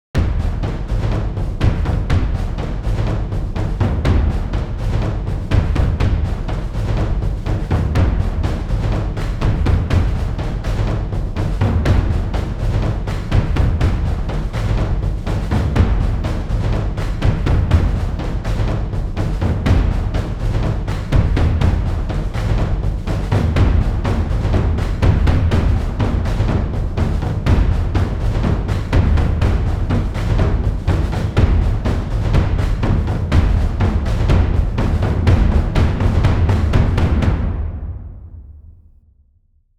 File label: Percussion; ProScores